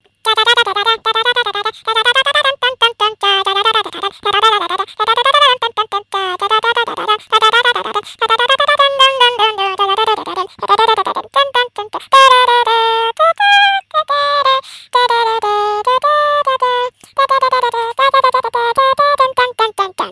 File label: Fast